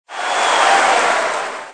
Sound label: crowd cheer